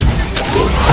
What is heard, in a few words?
robot big movement